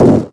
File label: Wave Rumble; Demigods; Playable Tutorial Level